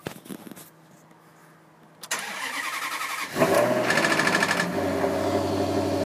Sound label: maserati cold start